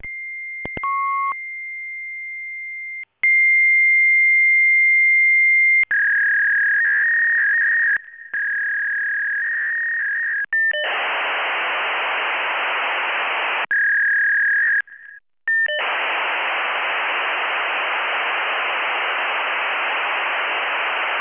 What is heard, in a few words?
non MPS call